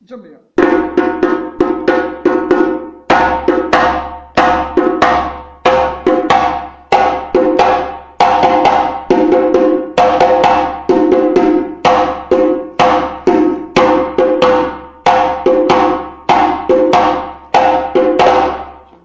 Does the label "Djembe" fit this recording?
Yes